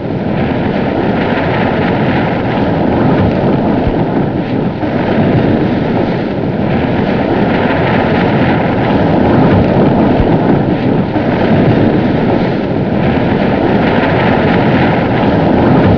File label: wind; de halla